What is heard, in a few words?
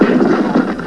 Knocked Down